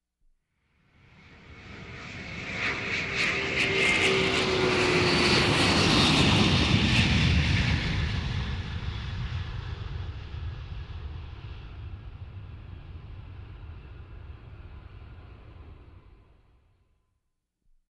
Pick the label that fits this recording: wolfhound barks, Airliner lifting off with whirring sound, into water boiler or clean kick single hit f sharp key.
Airliner lifting off with whirring sound